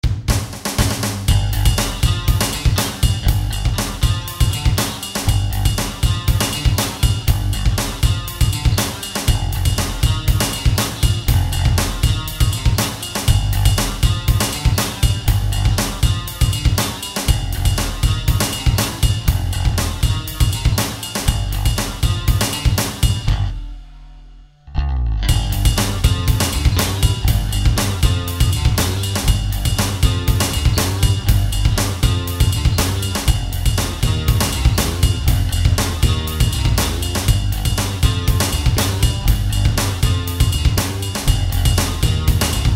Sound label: Riff